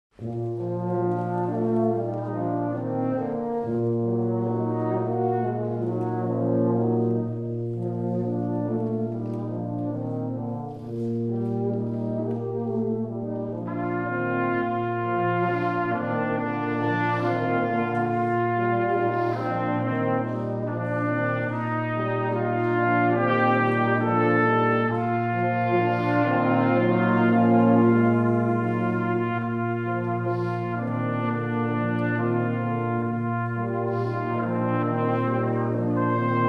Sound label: Brass Quintet America the Beautiful, Army Band; Military